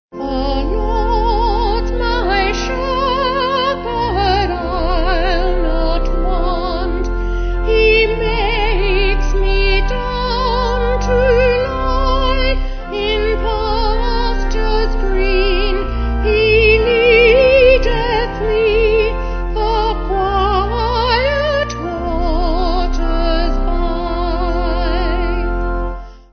Vocal Organ

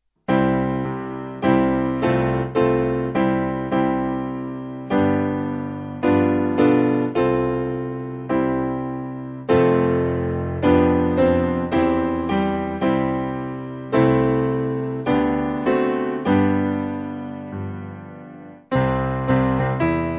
Piano

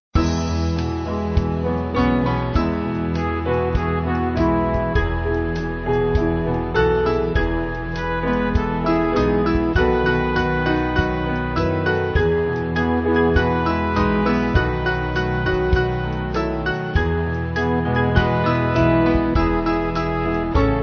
Band; We See The Lord; 2/E-F With Descant

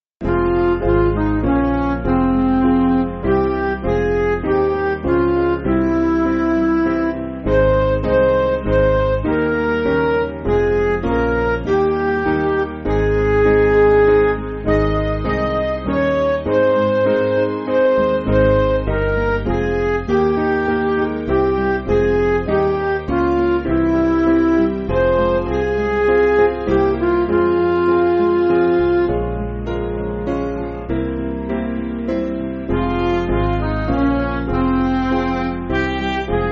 Midi

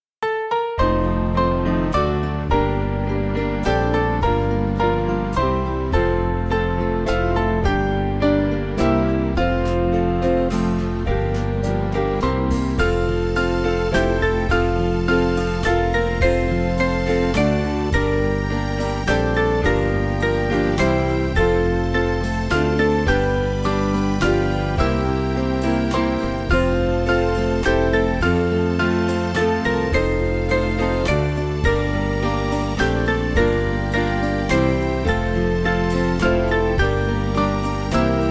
Away In A Manger, Cradle Song, Band

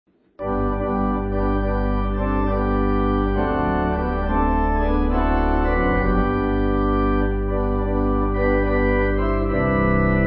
Pipe; In Tenderness He Sought Me

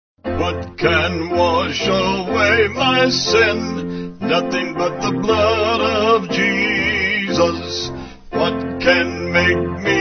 Vocals Piano

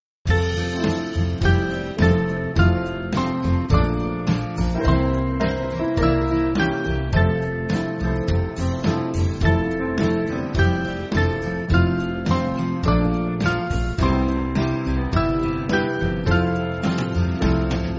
More Love To Thee, band

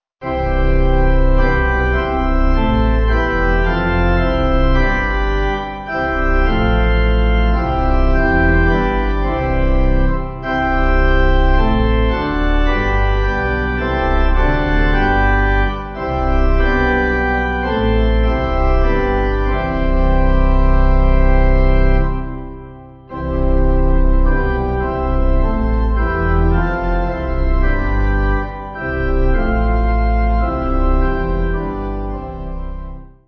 The Is The Day, Pipe; London New

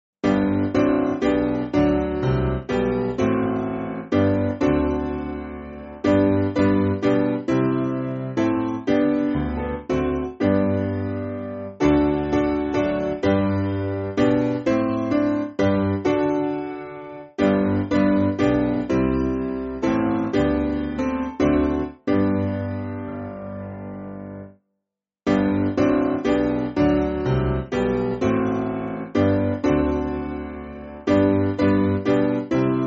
Come Gracious Spirit Mendon SPiano

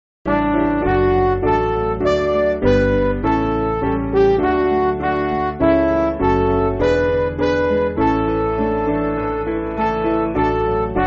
We Limit Not The Truth, Petersham, Midi

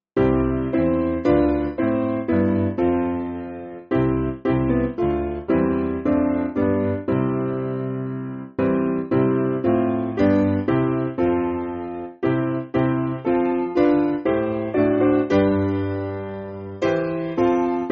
Piano, Free Music Backing Files for Churches; O Thou Whose Hand; Pearsall

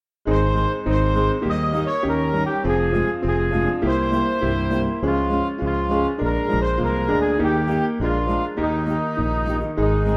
Ring The Bells, Midi; Root

